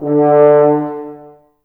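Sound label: Brass French Horns D; Sample CDs; Miroslav Vitous; Woodwind Brass Ensemble; F.Horns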